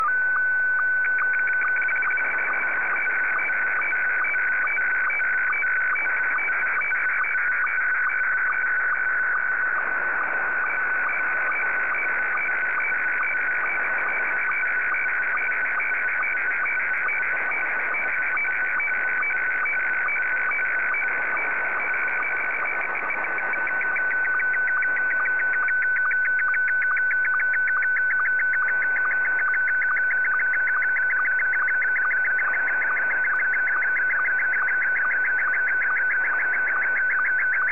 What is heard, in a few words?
sstv; S1; VK7NMH